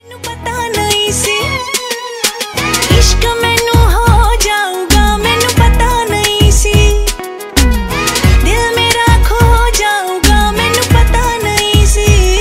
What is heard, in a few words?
Punjabi Song Ringtones